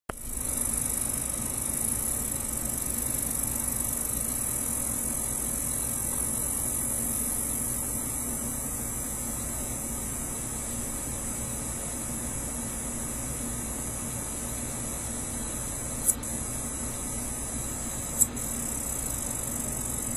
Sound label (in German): Wanstschrecke, Rufe